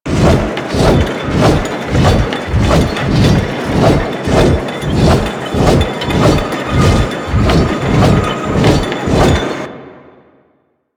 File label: Forest Cave Turbine Loop; Ambience; Props; Warframe; Grineer Forest